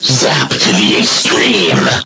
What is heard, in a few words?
Game Over